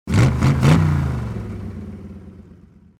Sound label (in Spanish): motor encendiendo